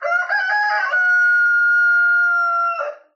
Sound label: Rooster